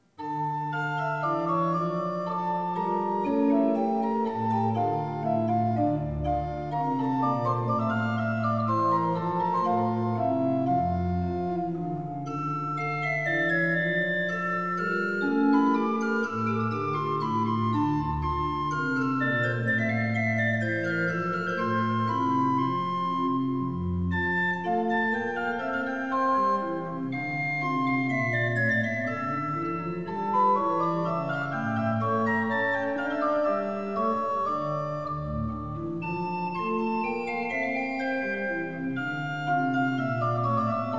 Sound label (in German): Menuett in d-moll; biggs bach book